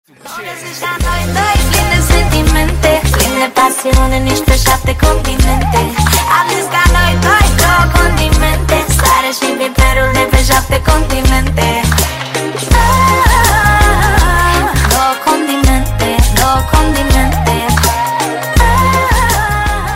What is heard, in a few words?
ringtone